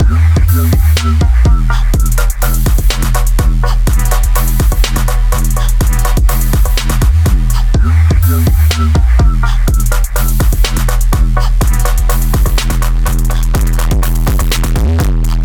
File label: emote; fortnite; athena; infinidab